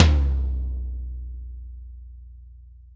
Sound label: TOM-TOM-L, ACOUSTIC KIT; Greytsounds VOL-1